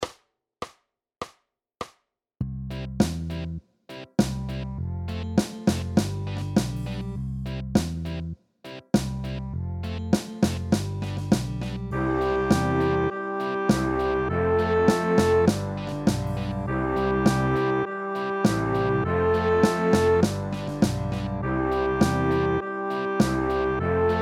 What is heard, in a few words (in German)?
reaggy fuer kleine trommel